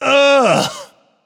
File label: nv ugh; cupid